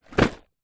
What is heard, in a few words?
food candy inside card box down on surface; zapsplat